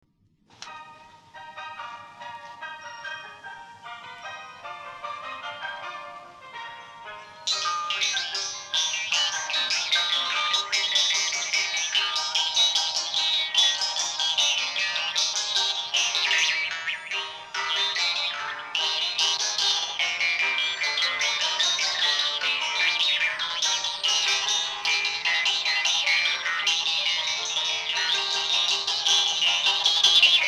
Jews Harp Band

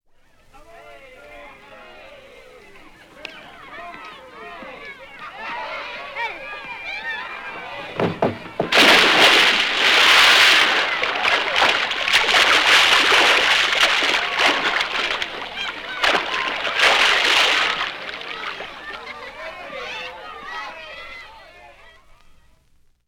Diving Board Children

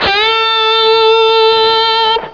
bend

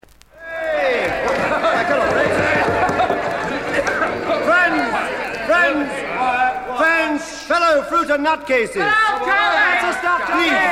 Party Noises

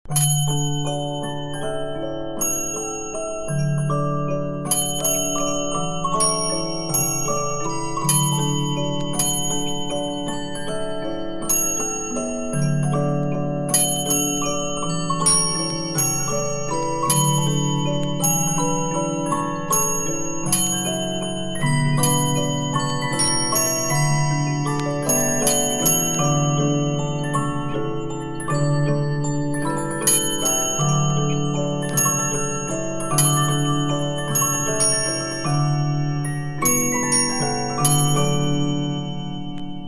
Bremond Bell Music Box; La Donna e Mobile

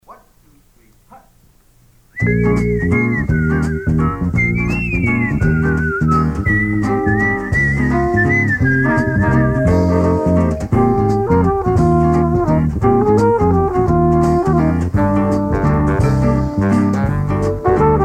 Music Bed

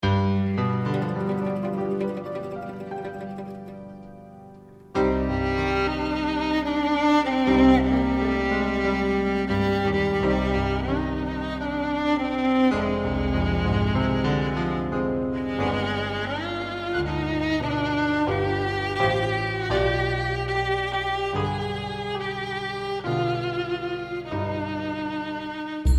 Jingle Bells; Special Ed Xmas

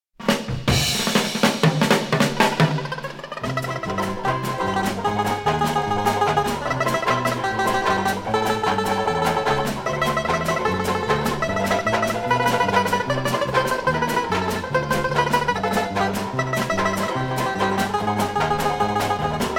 High School Marimba Band; The Fairfax High School Marimba Band

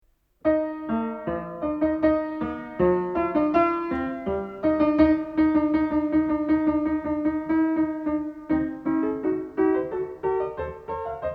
Suite for Quarter-tone Piano; Alois Haba; No. 6, Op. 88

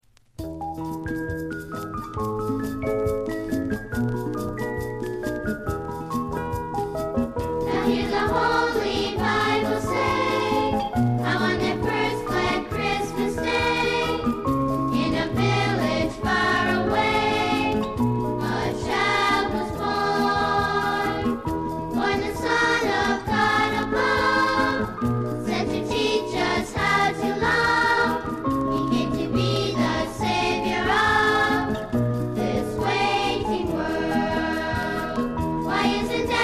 Choir

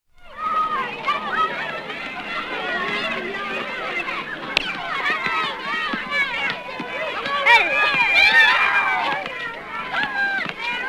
Children Playing Outdoors

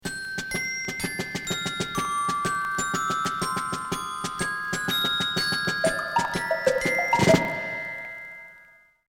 Xylophone Transition

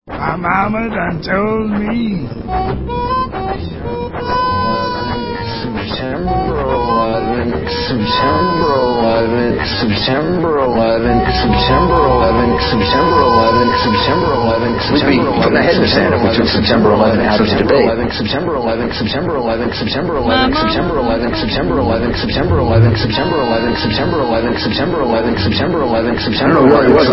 Blues

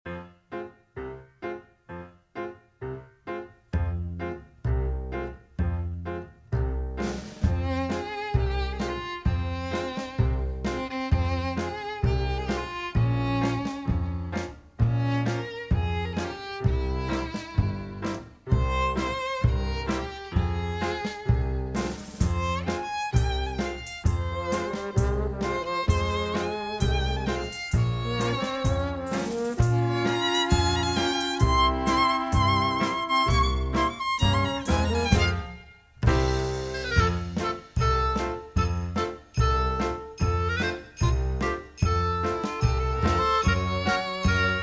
Jingle Bells; Special Ed Xmas

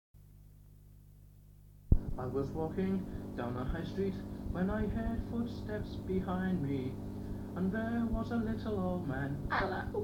harmonica

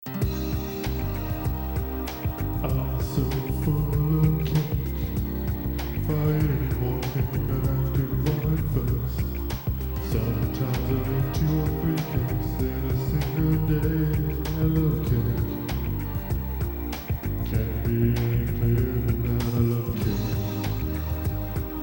SEXY Smooth Cake; cake covers

